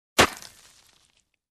Stone thumping

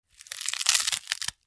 Crackling

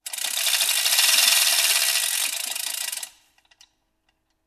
Machine Metal Bracket Rolling

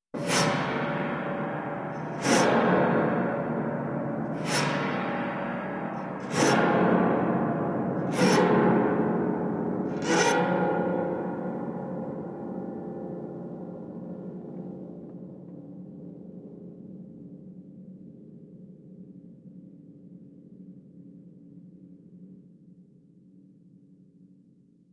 Horrific Steel Scratching echo sound

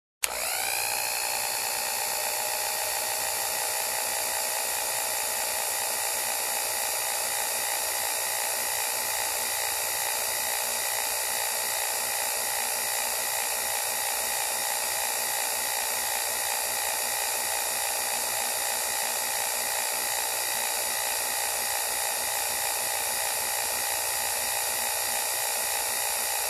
Electric Hand Mixer

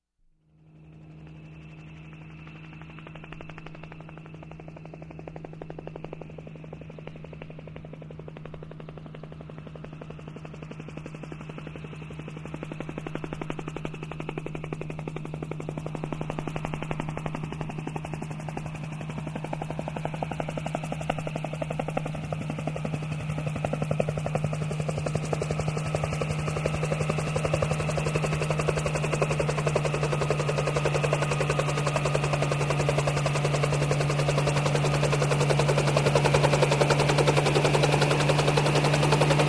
Helicopter Blades Whirring Nearing

